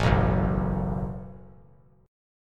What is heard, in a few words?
F sharp Minor Double Flat 5th; strummed